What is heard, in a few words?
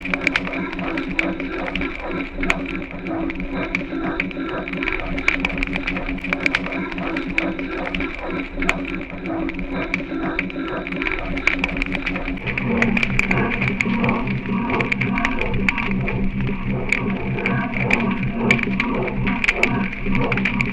Night Light crackle